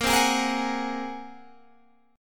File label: A sharp 7th Suspended 2nd Sharp 5th, Guitar Standard chord; strummed; 18,15,18,15,19,16; JGuitar